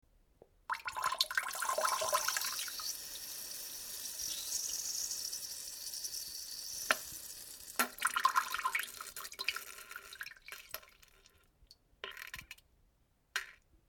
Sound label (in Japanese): おしっこ 途中から うんこも一緒に